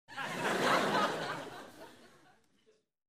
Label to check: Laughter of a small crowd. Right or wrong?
Right